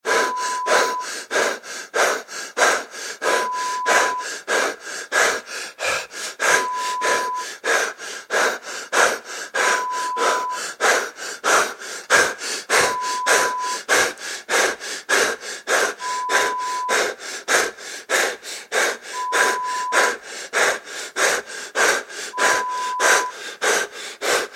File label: Out of Breath, Horror Sound Effect